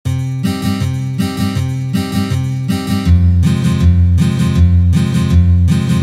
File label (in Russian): boj na gitare